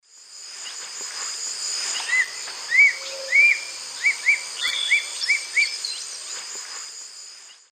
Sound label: Spizaetus ornatus, song; S. America; Eastern Lowlands